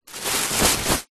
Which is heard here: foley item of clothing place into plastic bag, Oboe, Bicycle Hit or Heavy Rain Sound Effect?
foley item of clothing place into plastic bag